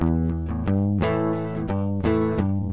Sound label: walking home blues, guitars